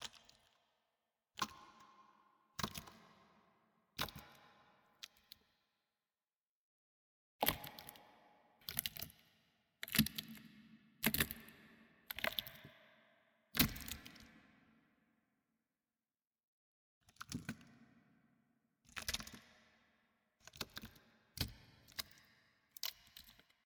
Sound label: Sea Below Deck Center